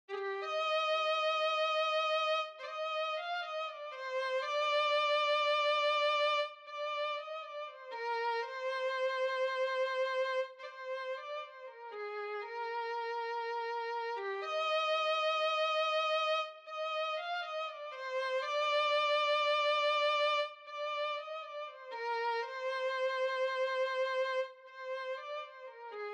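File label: violin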